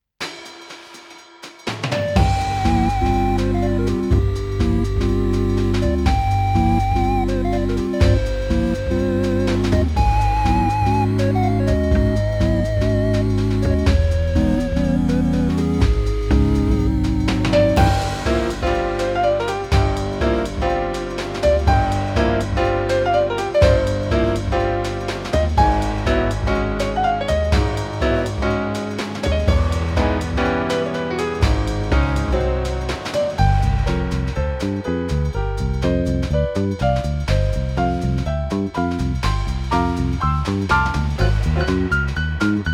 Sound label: puzzle jazz